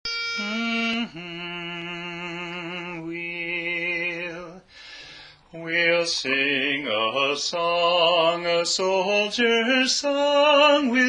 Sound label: The Soldier's Song - (Lead)